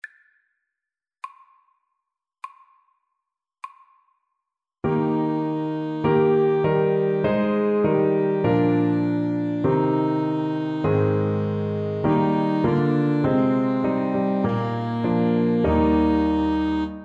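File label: Alto Saxophone Duet, karaoke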